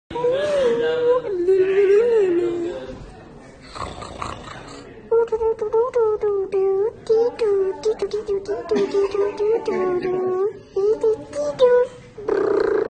Beep boop

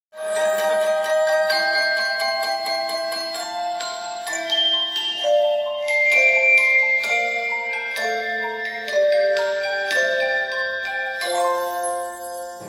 Music Box; HIMYM

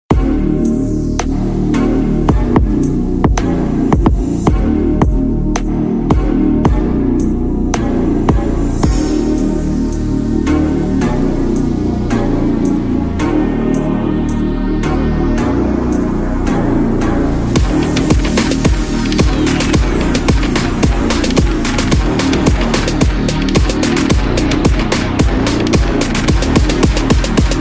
Brass

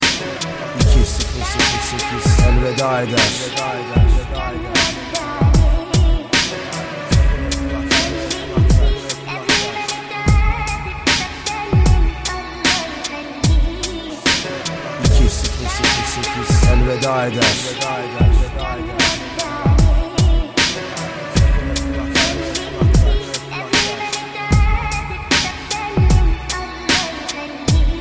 Arab Tone

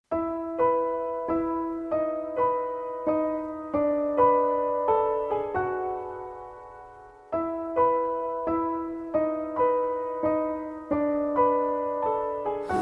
Piano

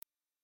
Mosquito Ringtone

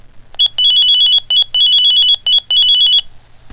Minitor 5 Alert

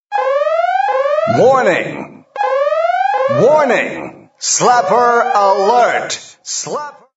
Warning Sms

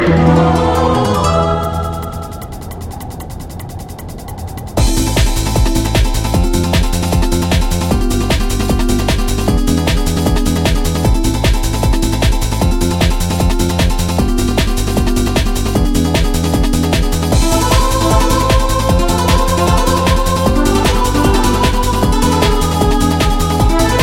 Techno

